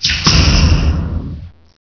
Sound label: door stop; gearbox